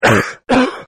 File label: Male Cough, Male Cough-2 Takes; Sick; Human